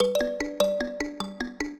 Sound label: minuet, mbira; Mozart